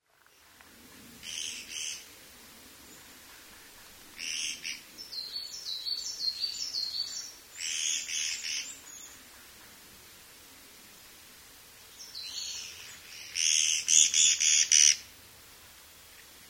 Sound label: kogera; コゲラ; 地鳴き